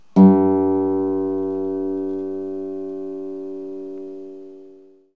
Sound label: guitar